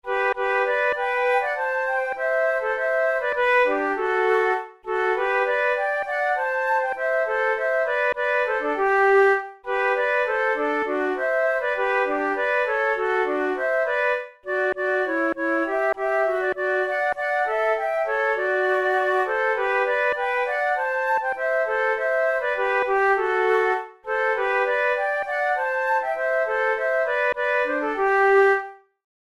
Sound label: duet; my heart and lute